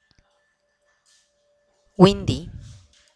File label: windy